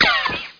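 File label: ricochet; MOTS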